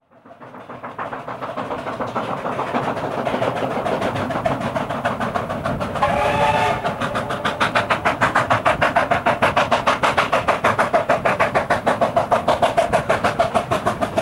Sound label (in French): locomotives a vapeur; sonotheque